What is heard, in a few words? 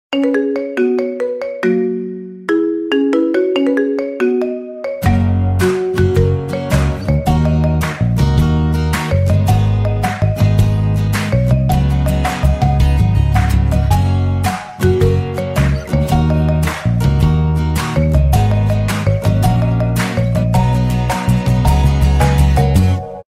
Marimba Ringtone